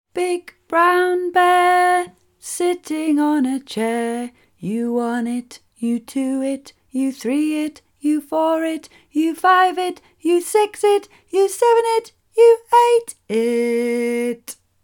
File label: Big Brown Bear Full Song